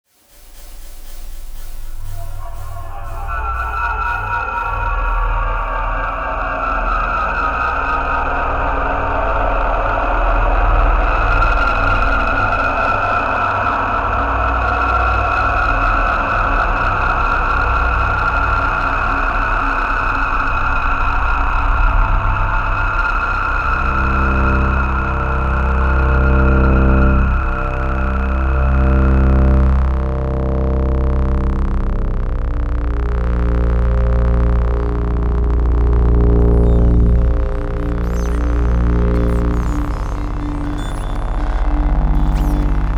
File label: soundscape